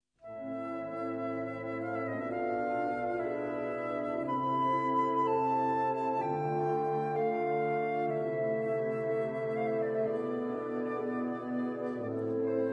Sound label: Adagietto for Flute